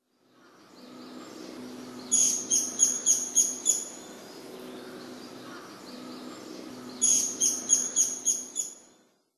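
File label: Japanese Pygmy Woodpecker; koe; kogera; mooka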